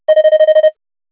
Office Pulse Hi

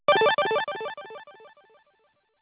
Business Rings

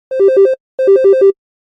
Office Ring 2x Slo, Business Rings

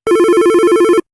Normal Ring Lo, Business Rings

